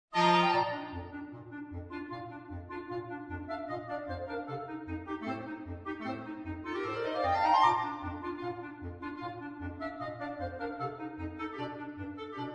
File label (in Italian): Polka quartetto di clarinetti